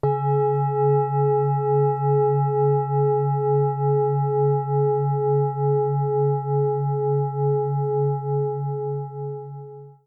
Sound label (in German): Klangschale; Jupiter Merkur Neptun Amor